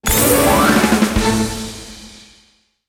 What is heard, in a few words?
Out Chapter Reward